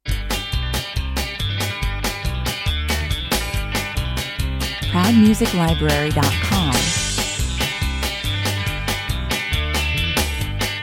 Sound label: Fast country featuring fast picked Telecaster electric guitar over bass, 140 bpm; Fast country featuring fast picked Telecaster electric guitar over bass and drums. A fun and jumpy, action filled country track.